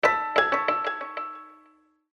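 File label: Felt Hammer Message, Fixed Piano; HTC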